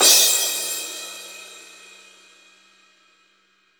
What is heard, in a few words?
crash; East Collexion; Drum 1 Dry